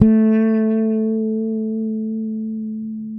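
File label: fret finger A, Pedulla bass; Masterbits CLIMAX 7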